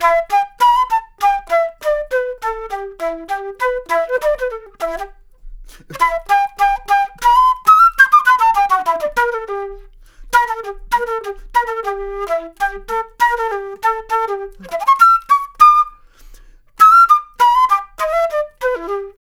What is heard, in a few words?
Flute Solo, Jazz; Flute Loops; Sonic Foundry (Sony Creative Software)